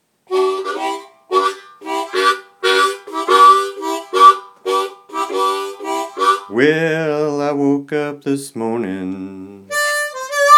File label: morning blues